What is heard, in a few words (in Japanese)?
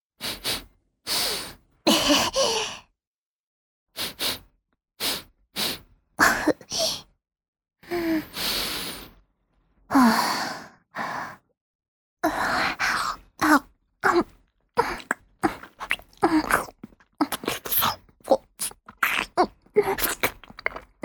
フェラチオ(中)：亀頭をしゃぶる, ASMR; 実用ボイス抜粋集